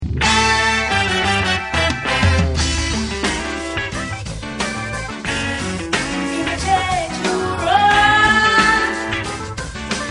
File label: instrumental; max rebo band